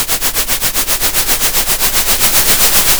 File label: pop, Sound of a train